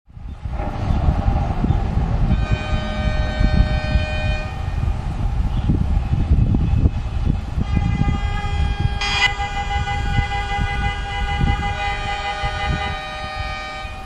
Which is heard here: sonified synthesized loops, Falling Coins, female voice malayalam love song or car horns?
car horns